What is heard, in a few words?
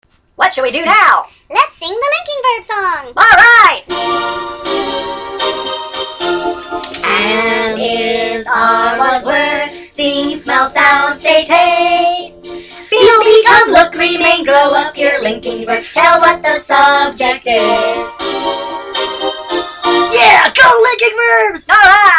Linking Verb Song; english